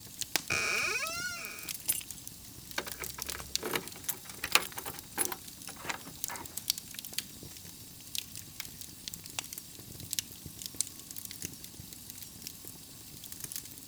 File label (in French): Cheminée porte clés